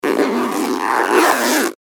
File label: zipper